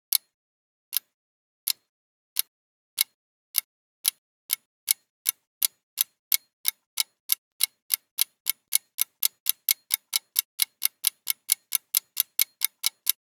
Clock Tick - Slow to Fast - Start Working; Hollywood Edge; sound designer's toolkit v2

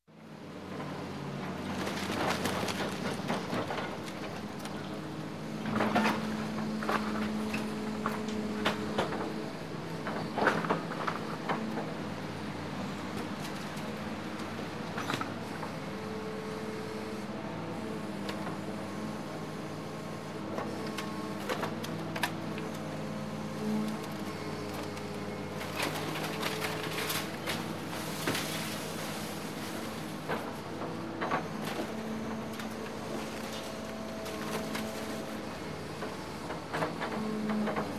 Construction, Demolition Bulldozer Wrecking; Sound Ideas 2000 Series

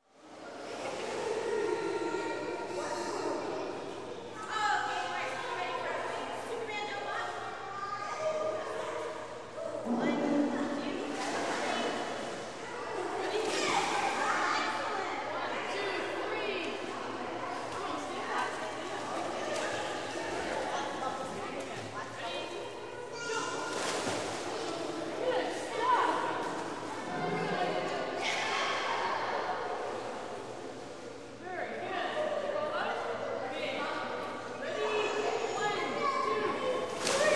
Swimming Pool; Sound Ideas 2000 Series

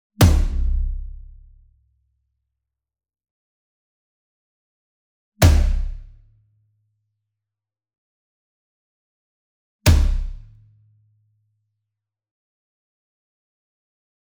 SINGLE DRUM Tom Concert Hit Whip Beater Near; boom library; Cinematic Strikes Construction Kit

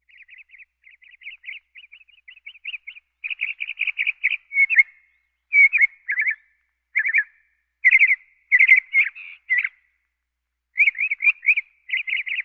Birds

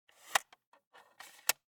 Viewmaster, plastic, lever, load release; Eiravaein Works; Vaeyan IV

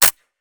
Musket Foley; Sound Ideas; Guns

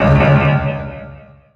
Pickup Scifi Energy; SFX Kit

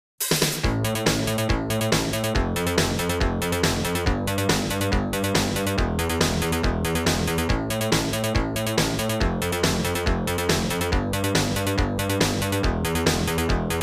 Jingles; 90 DDD Jingles

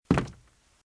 Footsteps - Wood - Creaky - Walk - Right; RadwinIsland

